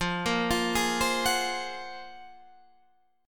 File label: F sharp Major Sharp 11th F